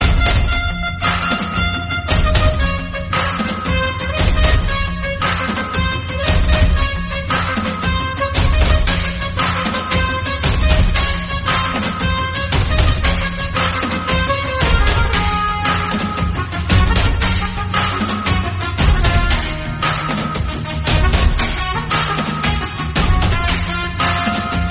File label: THEME MUSIC RINGTONE; BAASHA